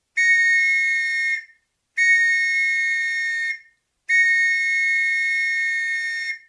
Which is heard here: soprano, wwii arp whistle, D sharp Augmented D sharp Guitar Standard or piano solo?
wwii arp whistle